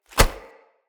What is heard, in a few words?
Kick Impact Flesh Armour Hollow; Envoy